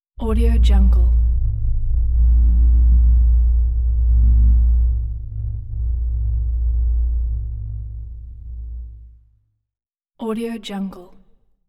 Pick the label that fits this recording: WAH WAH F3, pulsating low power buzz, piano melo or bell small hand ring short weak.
pulsating low power buzz